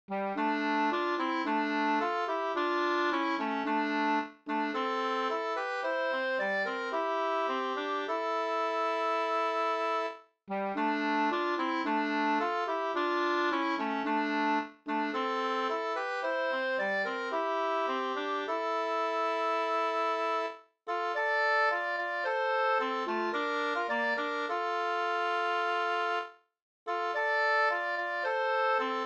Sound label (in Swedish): vismelodi ballad